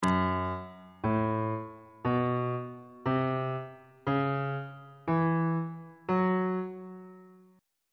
f sharp blues scale on bass clef

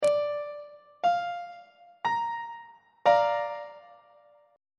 b flat major 1st inversion on treble clef

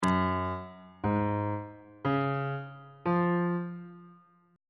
g flat major 7th suspended 2nd chord intervals

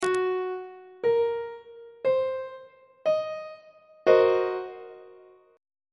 d double flat half diminished 7th 2nd inversion; treble clef

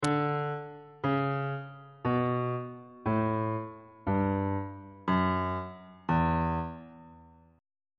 f flat dorian mode descending on bass clef

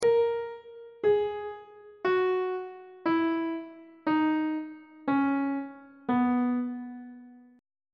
c flat major scale descending on treble clef

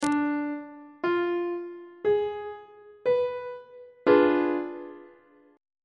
c flat diminished 7th 1st inversion; on treble clef

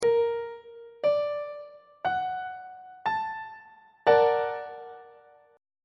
b flat augmented major 7th chord on treble clef

